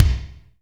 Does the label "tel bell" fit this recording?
No